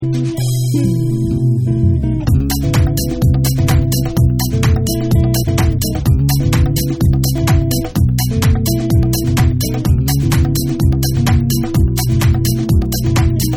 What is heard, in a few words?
JAZZ